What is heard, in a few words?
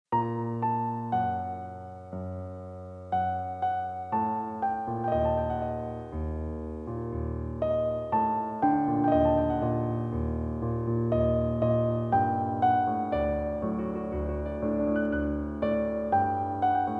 Piano